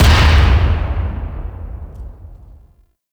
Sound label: Cave Door Close; Ambience; Gameplay; Warframe; The War Within